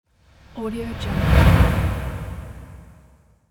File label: air sweep transition